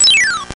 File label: 2600 Pac-Man Beeew